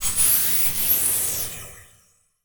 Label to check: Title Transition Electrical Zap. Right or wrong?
Right